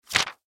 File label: Book Hardcover, Book Hardcover Flip Single Hard; Foley; Books; Offices; Blastwave